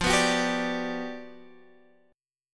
G Major 7th, F; strummed; JGuitar

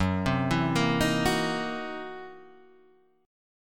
C 7th Sharp 9th Flat 5th Gb

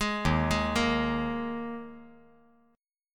Eb Suspended 4th Eb 5G 4C 5E 5A; Ebsus4 arpeggiated; JGuitar

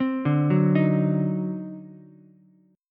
D 7th Flat 9th, Ukulele C6; 5,2,2,6; arpeggiated; JGuitar

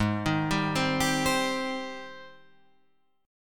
Ab Major 7th Ab down Guitar Open F; AbM7 arpeggiated

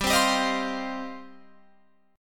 G 7th Suspended 4th Sharp 5th, Guitar Open F; Listen to G7sus4#5 strummed; G7sus4#5 Chord

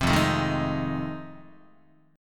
F 7th Sharp 9th Guitar, chord; strummed; F7#9; 3,1,0,1,0,2; JGuitar

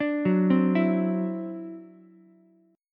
E 7th Suspended 2nd Sharp 5th, E, down, Ukulele; E7sus2#5; arpeggiated

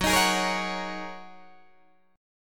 F sharp 6th Add 9th Guitar Open D, chord

